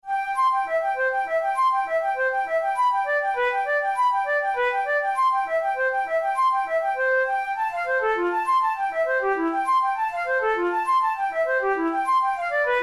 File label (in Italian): capricci per flauto traverso; ruge